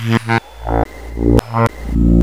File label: ENSONIQ ALTO SAX